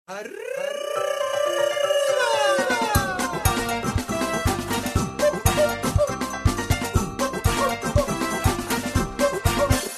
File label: music bit